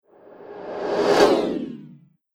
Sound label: Movie Trailer Awesome Whoosh; Andrew Fleming